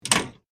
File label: break; Mindustry